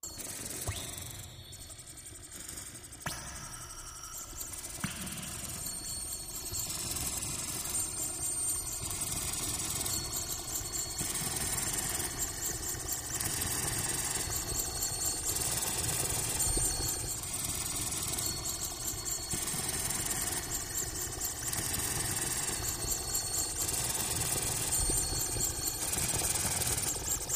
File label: Timid Flutter Machine, machines winches